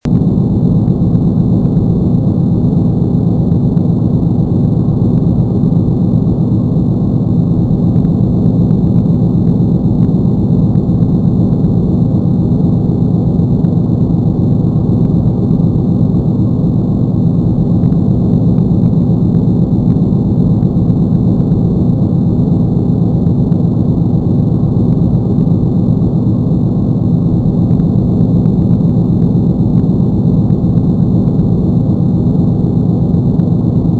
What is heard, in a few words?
Ambient